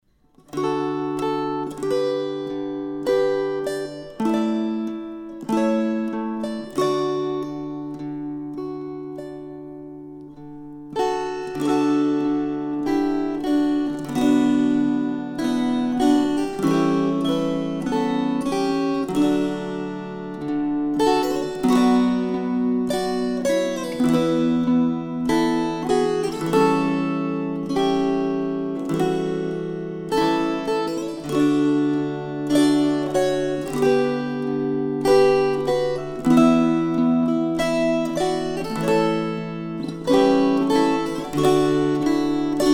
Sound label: String Choir